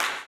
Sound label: CLAP, blues & soul; ILIO; Vocal Planet